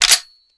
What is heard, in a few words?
m14 bolt catch, weapons; garrysmod